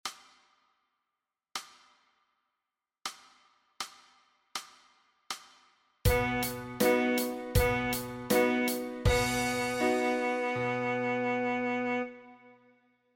sax G sharp

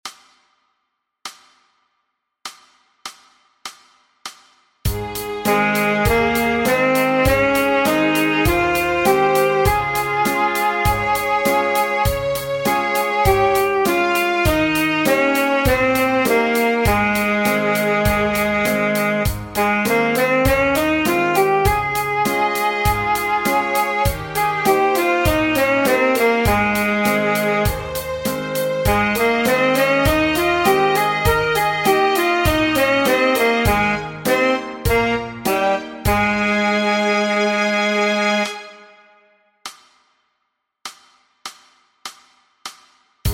Sax F Scale Quarter

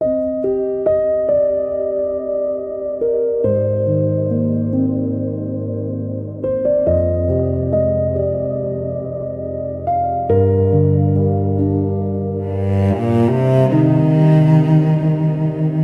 Slow Rhythm For The Rain

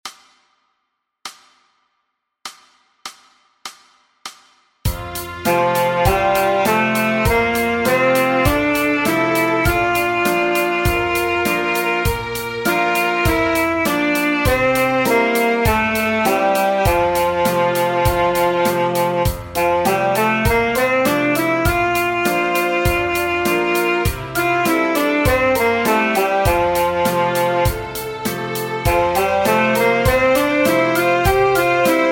Sax D Scale Quarter

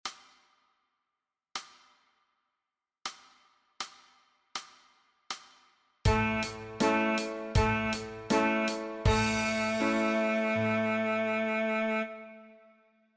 sax G flat

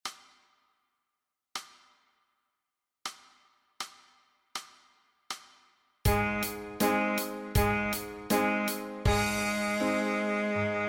sax F

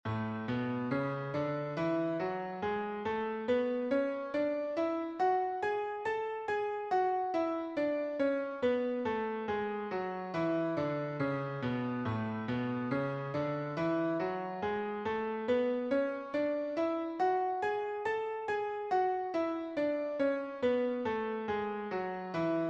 Scale A Two Octaves

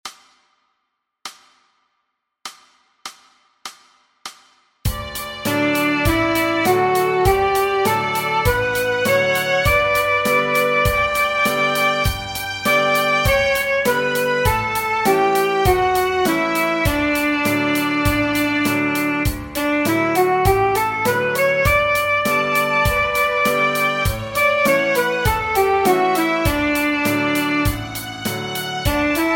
Sax B Scale Quarter

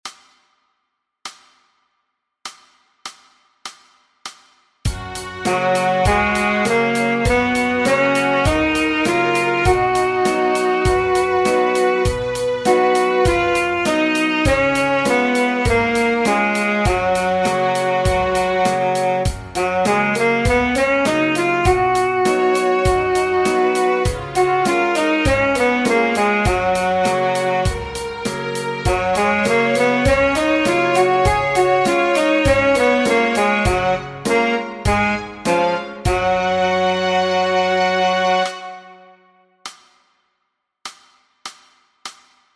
Sax Eb Scale Quarter

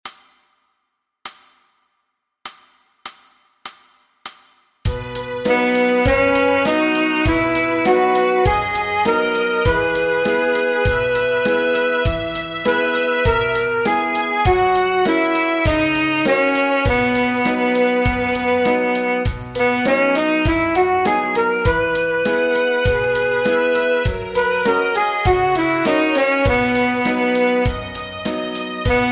Sax Ab Scale Quarter

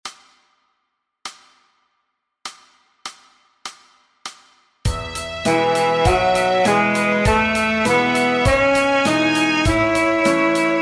Sax Db Scale Quarter

